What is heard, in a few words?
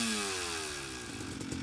Decel, Engine